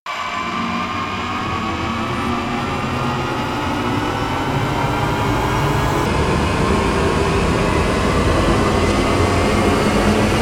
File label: Blaring Rise Only; Ninja Tracks